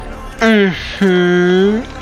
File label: mhm